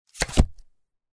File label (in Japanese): カードを裏返す; board game casino mahjong